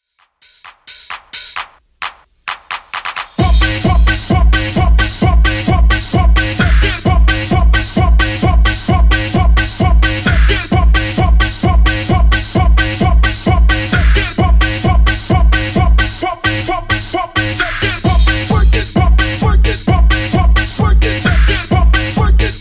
90s Xtend 131bpm

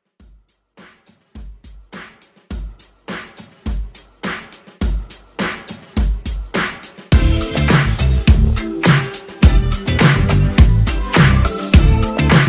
Pop Redrum

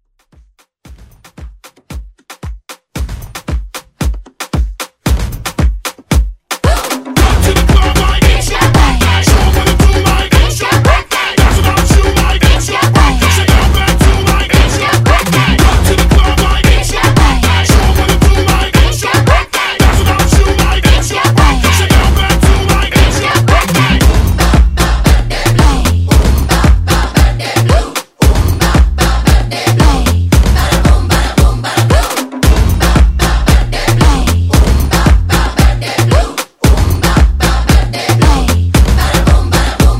Pop Hype Redrum

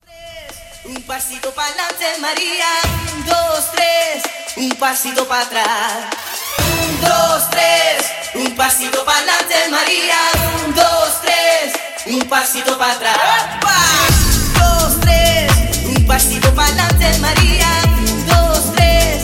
Mashup; Remixfreakz

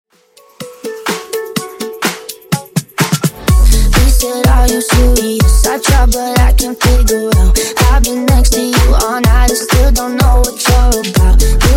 Funk House 125 Bpm

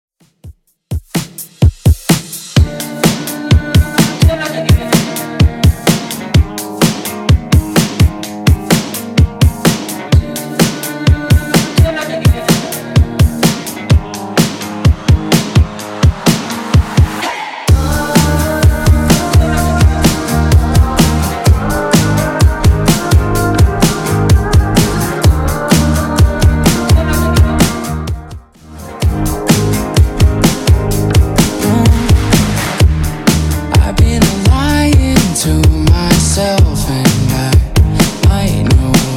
Top40 Redrum 127bpm

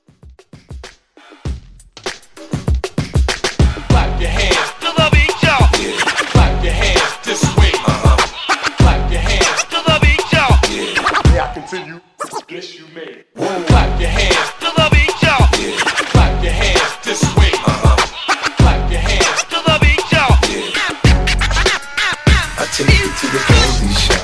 OG To House Transition

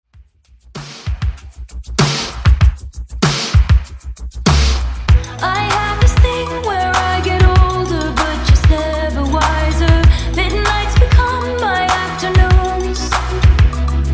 Top40 80s Style, 97 Bpm; Redrum

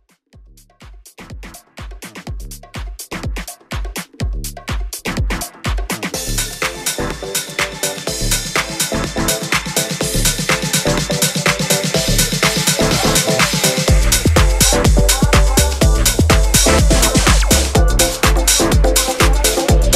Deep House 124 Bpm

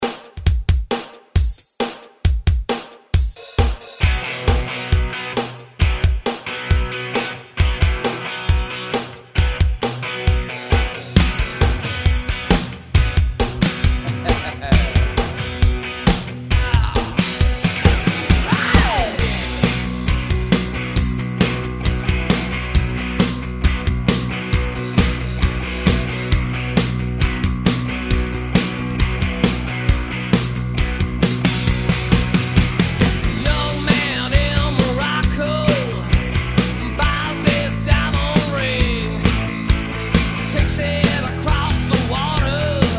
Re Drum; DJDOLLS

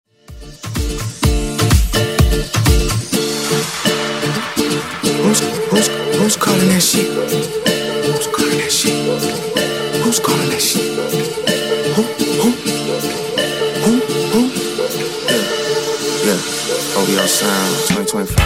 Funk MashUp, 126 bpm

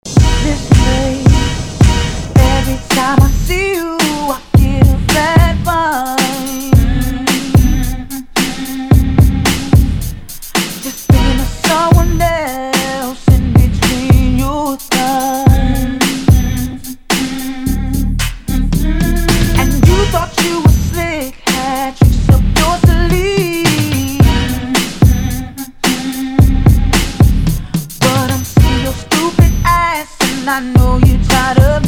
Club Party MashUp

